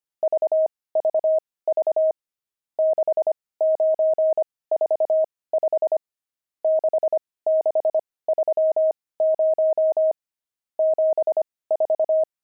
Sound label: pangram, intermediate pangrams files